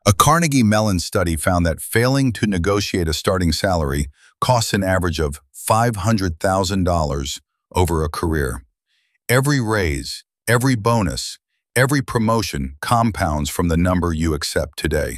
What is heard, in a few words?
briefing beat; lowball offer